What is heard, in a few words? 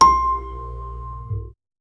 kalimba mbira thumb piano